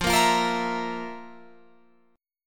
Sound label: F Major 7th Suspended 4th, Guitar, Open F; strummed